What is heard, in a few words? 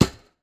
Maple Brush, snare